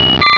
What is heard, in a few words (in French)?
Cri